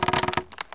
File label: wood creak; quake2